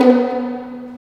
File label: STR VLN PIZ, String Sections, Violins Pizz; Vls Pizz; Roland LCDP13